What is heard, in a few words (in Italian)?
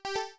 suoneria botton; beep